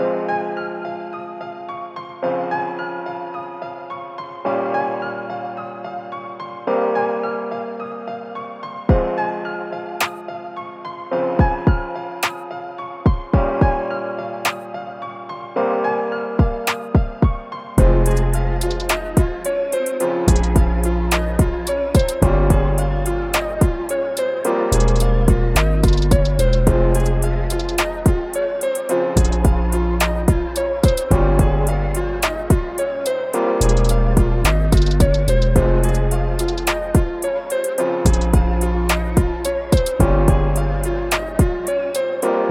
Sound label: type beat, bpm108 f minor